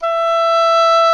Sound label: DOUBLE REEDS; OMI - Universe of Sounds